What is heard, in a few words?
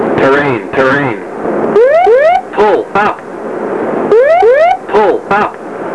whoop pull up